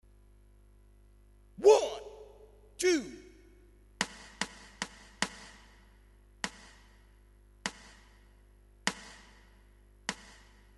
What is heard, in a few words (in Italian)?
9/8 16 misure